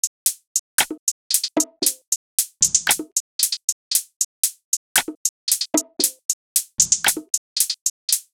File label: Perc Loop - 115 BPM; Drum Loops